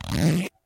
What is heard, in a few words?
zipper